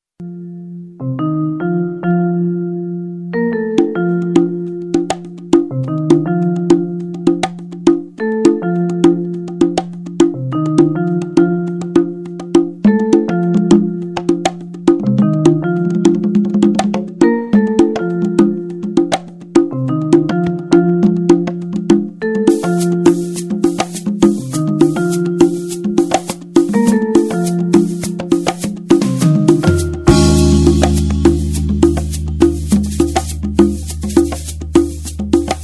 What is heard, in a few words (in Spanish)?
tambor